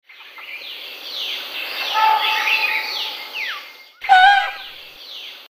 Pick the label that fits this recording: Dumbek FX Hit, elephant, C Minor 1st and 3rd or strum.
elephant